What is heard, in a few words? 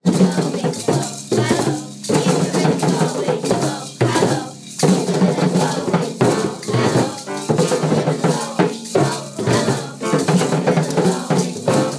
drumming